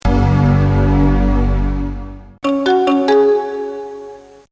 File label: mac startup